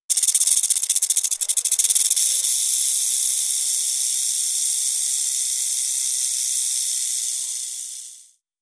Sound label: Rattle Snake Long; Animals; XBOX; BassProShopsTrophyHunter2007